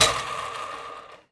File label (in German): metallrollen rollenband; salamisound